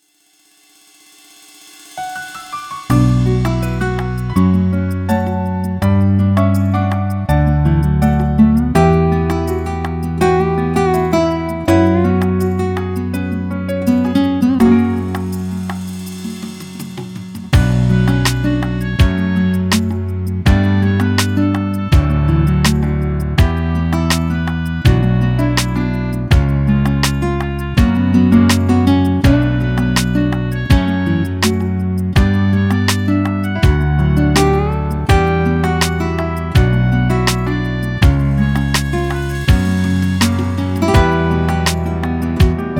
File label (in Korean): Piano ver, 멜로디MR